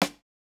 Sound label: snare, snare house